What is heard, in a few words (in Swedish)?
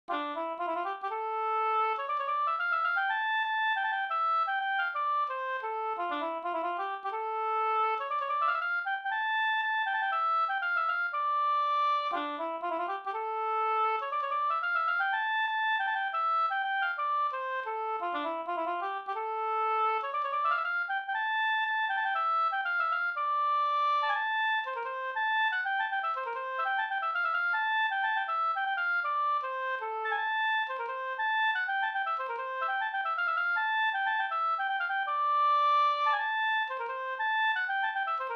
polska storpolska